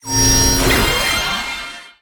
Fc level up

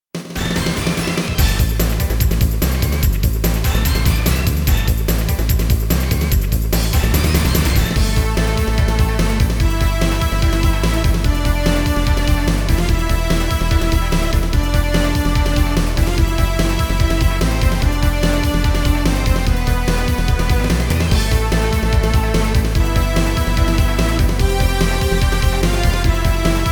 Justice Horn; Elemental Gimmick Gear

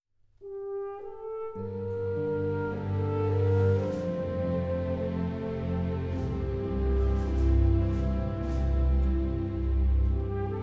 Victory Melody; Call of Duty 3

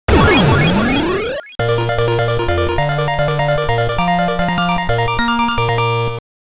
GAME OVER, Game Over

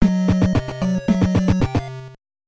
Unknown Jingle; Star Trek: 25th Anniversary; NES

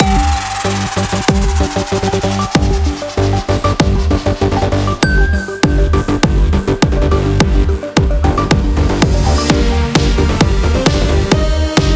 March Loop; null vector

